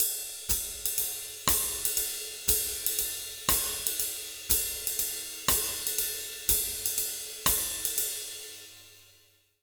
120 BBAND, Jazz Latin Drumloops

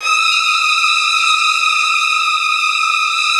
VN TRILLS, Virtuoso Strings

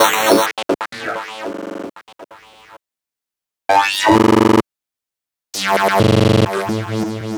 Noisy Gate, Synths

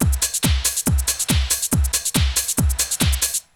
Essential beats; FM 57 - The Ultimate Sample Collection (1997); Repedative Nature(142), Psycho(140), Crowded(140)

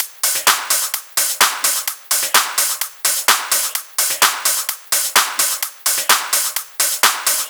Loops 128BPM, Drumloops

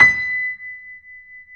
B 5, Keyboards Acoustic, GRANDP ST; USB Soundscan vol.17

